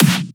Snare Clap; FLPRO

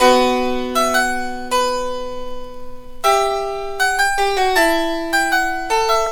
Loop

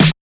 Snare; FLPRO; Snares

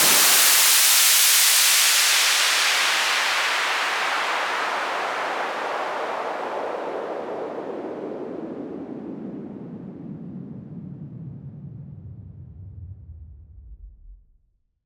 Fall Clean, Falls

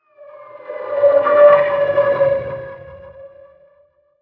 Windows XP Startup Sound in Aerophobia, Vocoder

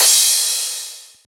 Crash

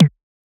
Percussion; FLPRO

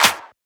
Shifted Clap, Claps; FLPRO

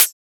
Closed Hat; FLPRO; 8. Closed Hats

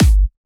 Kick; FLPRO

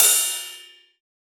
Ride; FLPRO

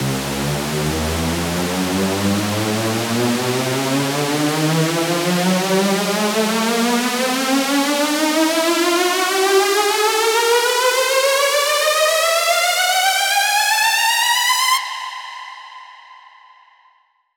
Saw C 8 Bars (Higher)

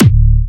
Fatterr D4, Big Room Kicks

